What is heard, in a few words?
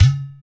PER Percussion